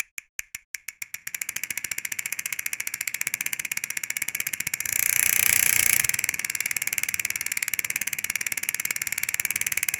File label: prize wheel spin noise, game show